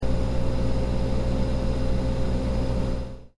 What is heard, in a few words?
refrigerator